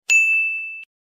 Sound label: ting